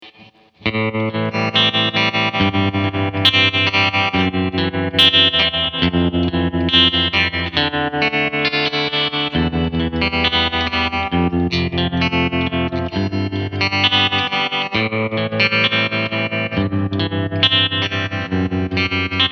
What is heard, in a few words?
Fender Tone Master Twin Reverb Blonde Vibrato Channel Treble 6 Mid 6 Bass 7 Full Reverb; Tremolo